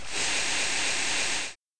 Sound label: fizz